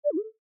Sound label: room setting updated